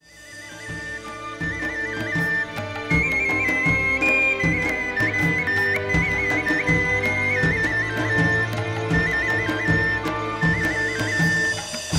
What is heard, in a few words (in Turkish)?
Fon Muzigi; Hz Meryem Dizisi